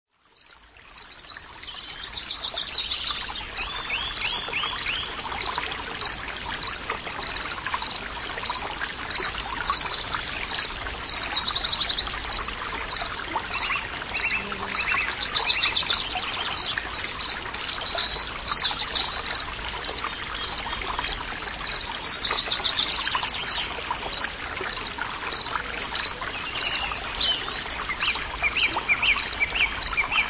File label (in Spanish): Brainwave Lab Magnum Naturalis El Arroyuelo Del Bosque